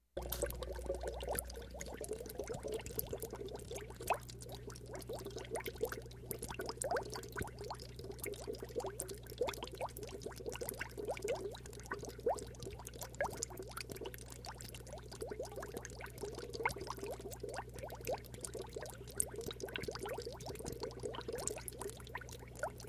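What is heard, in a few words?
Aquarium Small Air Filter, bubbles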